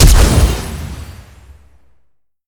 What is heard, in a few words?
summonerspell base flash; LoL